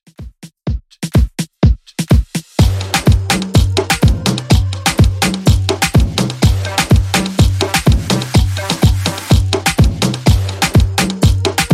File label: Afro Redrum 125bpm